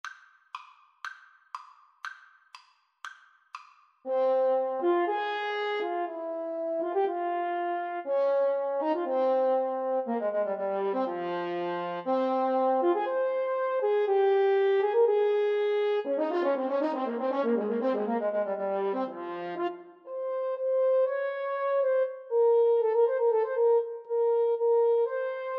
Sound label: French Horn Bassoon Duet